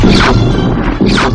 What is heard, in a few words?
Fusion Cannon Fire